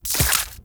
Arch Gun Primary Beam Impact Flesh; Weapons; Warframe; Archwing; Hildryn

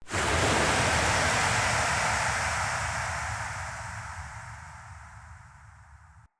Eternium Wave Crash; Ambience; Eternium Garden

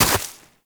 Operator Walk Snow, Footsteps; Warframe

